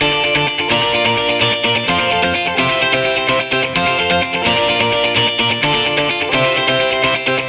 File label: Electric Pop Guitar 128BPM Dmin; Vengeance - Essential Clubsounds Vol.4